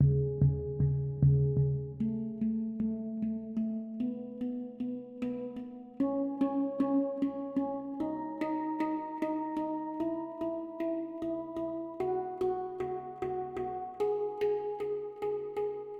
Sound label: 5 in a row reverb mid